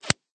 zip snap

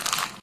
paper scrunch

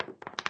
dice roll close short

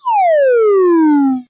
curving down

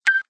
tone

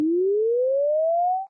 draw line

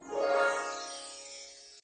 magic harp

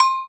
cowbell

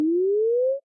arc grow short

